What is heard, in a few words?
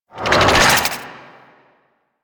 Tendrils Cast; Warframe; Warframes